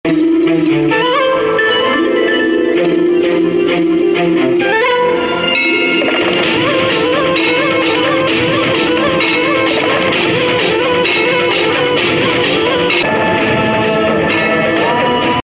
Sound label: flute music; dance